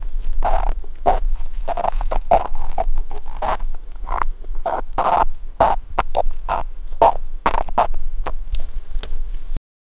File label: clattering paws on cobblestone